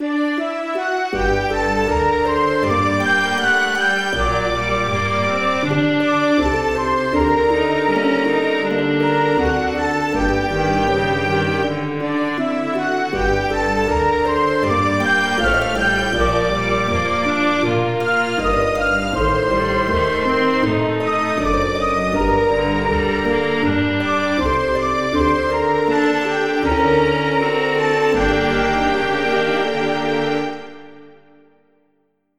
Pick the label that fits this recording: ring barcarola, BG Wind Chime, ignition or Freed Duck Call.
ring barcarola